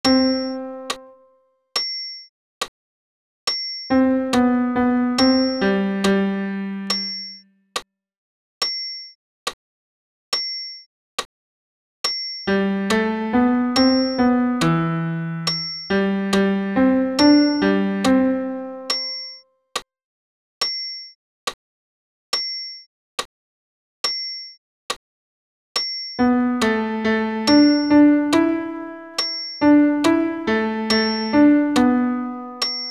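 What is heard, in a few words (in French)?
Ite missa est baryton piano seul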